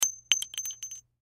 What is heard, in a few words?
Bullet Shell; Bullet Shell 38 Special Drop Cement; Drops (Sound Effects:Objects); Blastwave; Warfare